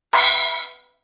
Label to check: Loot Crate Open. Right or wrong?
Wrong